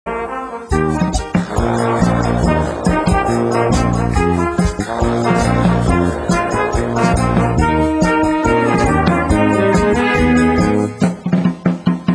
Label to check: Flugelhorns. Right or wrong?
Right